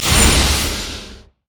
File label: ally teleport; LoL; thresh